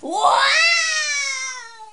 woooaaah

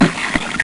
rip

